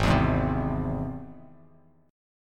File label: Gsharp Augmented Gsharp Bass Standard; strummed; 4,7,6,5; JGuitar